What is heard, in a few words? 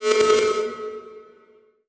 Transition Laser Warble; Adobe; Production Element